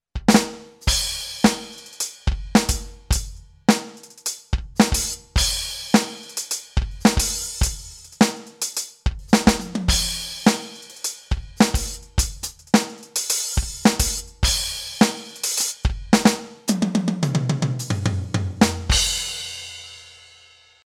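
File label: Alesis Crimson II Kit Funk, drums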